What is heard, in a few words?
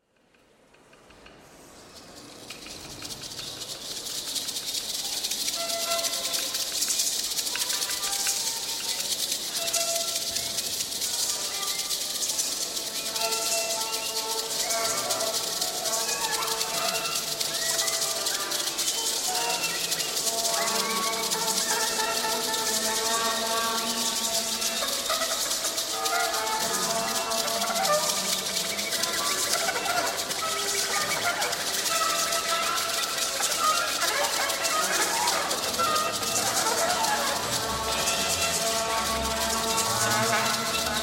insect improv